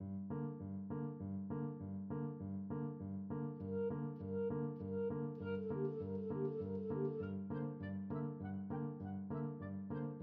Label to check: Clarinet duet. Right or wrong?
Right